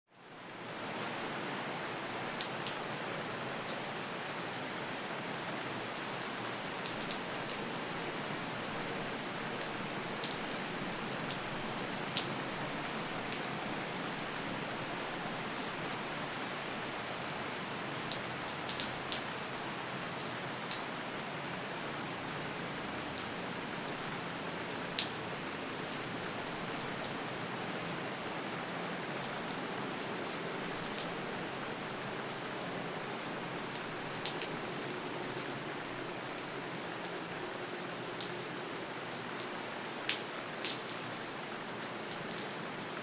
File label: rain, ambience sounds; Light; Rain 6 (Light)